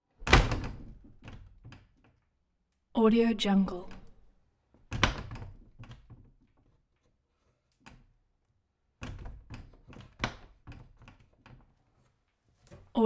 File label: door bang wood swing broken